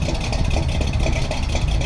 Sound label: engine rumble; raydium